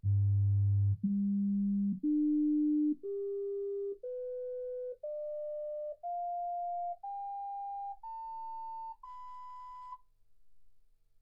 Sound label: sinus tones 48kHz